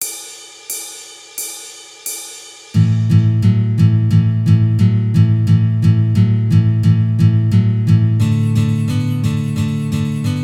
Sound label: Blues Straight